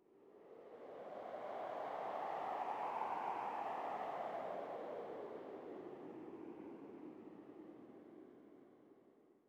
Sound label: sting wind gust; Rust